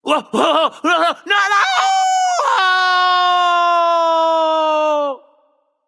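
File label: non verbal fear, voice; cernunnos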